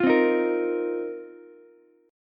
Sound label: Bb Suspended 2nd Suspended 4th Bb down Ukulele; strummed